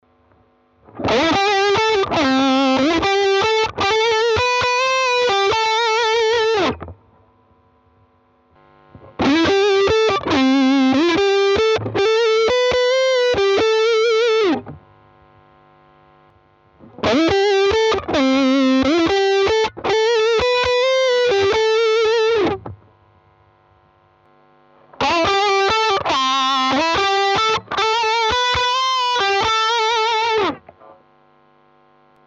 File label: Lead Raw Fender; JAG-STANG